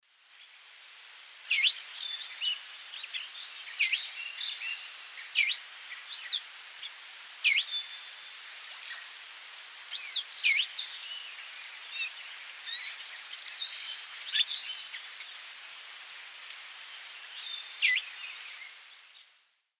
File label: Thraupis episcopus, calls; S. America; Eastern Lowlands